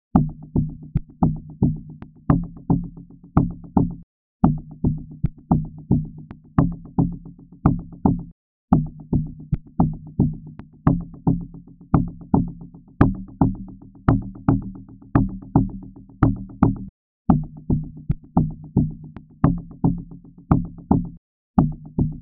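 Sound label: Bass Loop D minor 112 BPM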